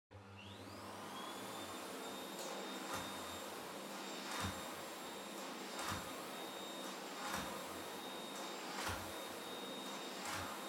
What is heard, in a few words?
Vacuum Cleaner Far Vacuum Floor Soft Mid